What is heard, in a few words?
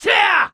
Attack